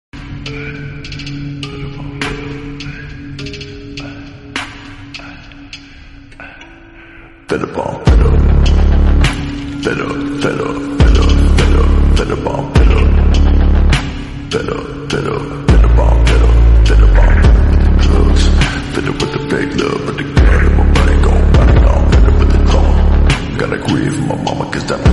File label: slowed reverb